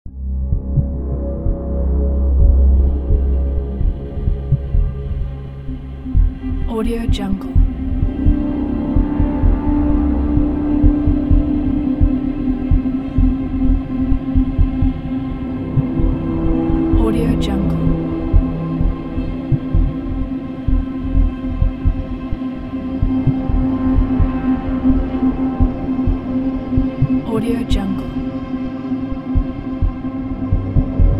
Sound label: cinematic landscape